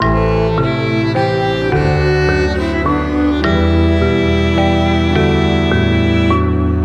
dorian miss

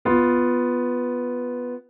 G7 tritone

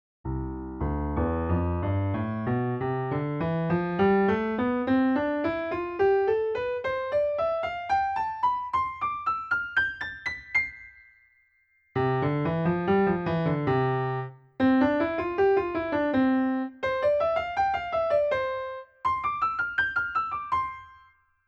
octave equivalence

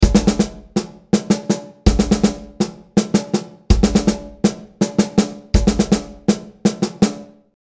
irregular 5t

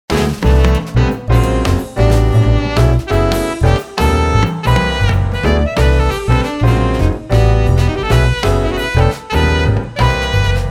jazz

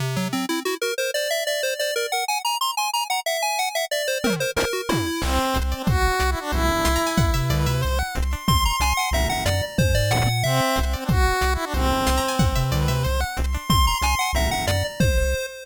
scale comparison wholetone

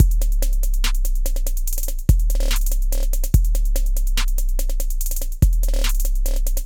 trap

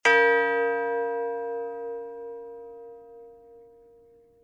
env bell

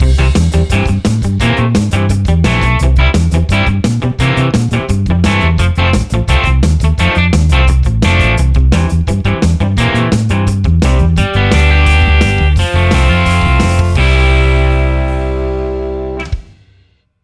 blues form

